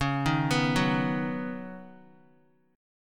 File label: Db 7th Suspended 2nd Suspended 4th, Guitar; x,4,3,4,6,x; Guitar-4F,4A,5C,5G,5C,6E; Db7sus2sus4 arpeggiated